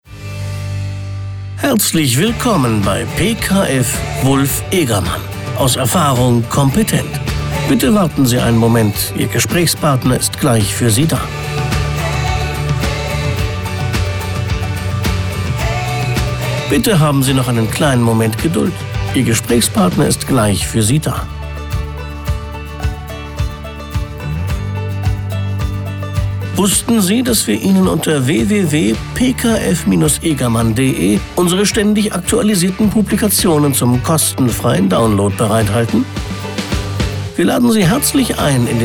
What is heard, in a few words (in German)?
Musik; Telefonansage; PKF Wulf Egermann; Telefonansage Steuerberatung – Steuerberater